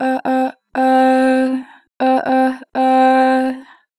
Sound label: Ah, Harmor Vocals; 2 bar flat; Pitch Flat